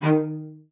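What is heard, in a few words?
CELLO spiccato D#3; Xsample VOL-5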